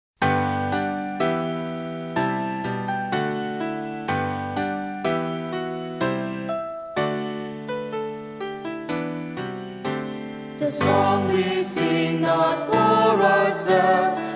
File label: piano